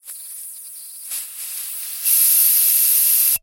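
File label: hair spray sounds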